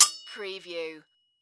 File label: Sword Impact Cutlass